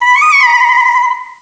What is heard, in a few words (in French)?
Cri